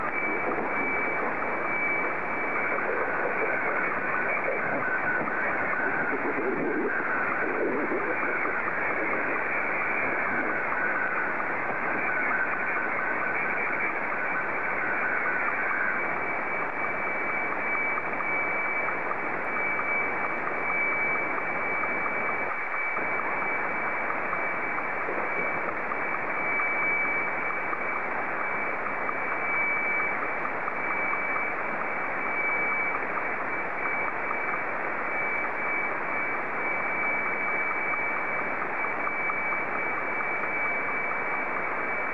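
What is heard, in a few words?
SSTV, Martin 2; KB9CJR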